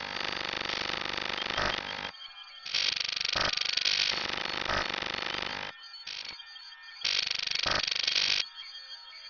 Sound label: computer loop